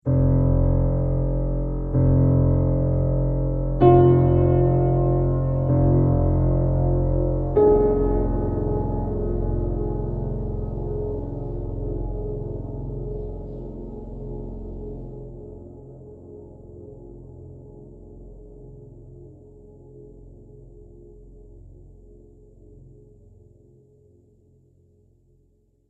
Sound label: Horror Dark Piano, Piano Sound Effects; musical